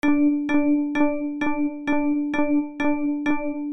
128 D Drop Bass

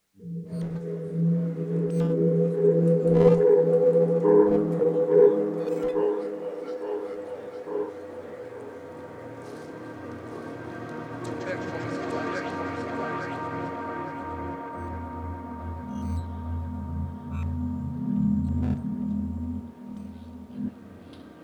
140 B Abstraction

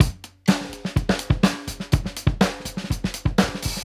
125 Live Drum Break Dry

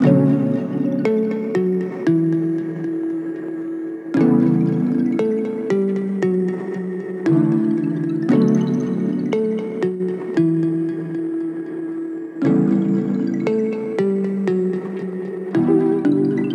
116 mallet Bm

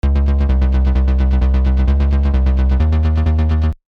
Bass Jump

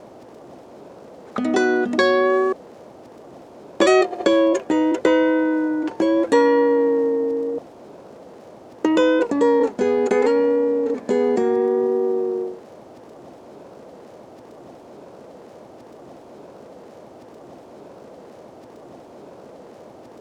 Guitar Loop, 95BPM, G-m

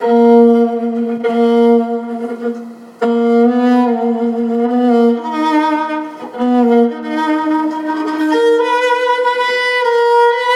Violin Loop Ebmin 140BPM

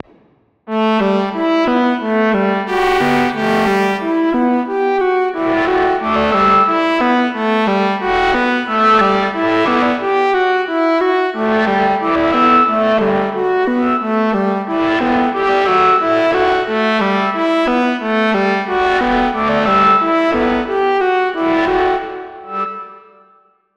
Horn Gm; RevHornType

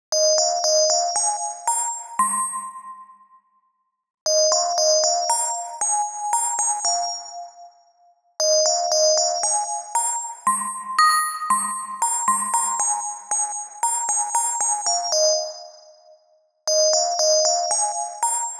Melody 116BPM G 80s Classic

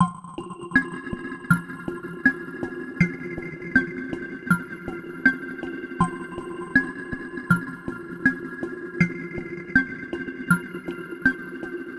160 mallet Fmin; cherry

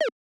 siren beeps dry; Deep Space Textures V2

